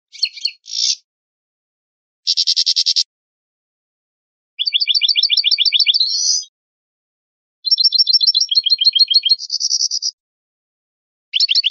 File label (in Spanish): faltas garra